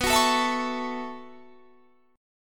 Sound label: B 9th Suspended 4th B down Guitar Standard; B9sus4; strummed; JGuitar